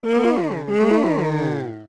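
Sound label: Gibdo Chase Moan; Pamela's Gibdo-Dad moaning while chasing Link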